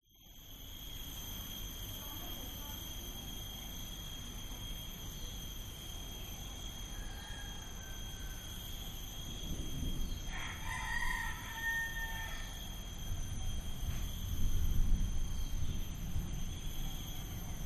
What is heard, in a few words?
nature rural ambience pigs chickens birds insects approaching thunderstorm bali; indonesia